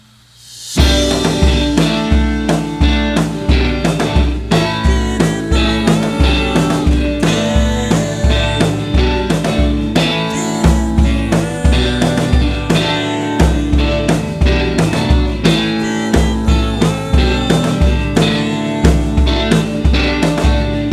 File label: Drums